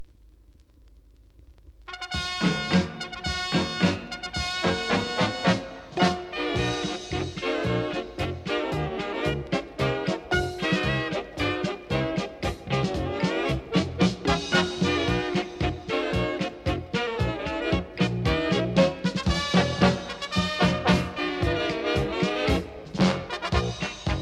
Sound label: polka